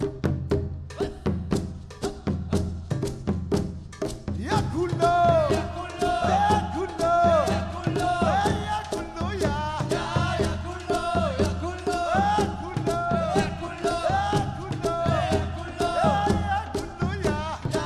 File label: drum Song; Arabic; World Music